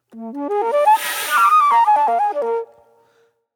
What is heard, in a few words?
Pop Flute; Sonic Foundry (Sony Creative Software); Manhattan Flutes; Slinky's Place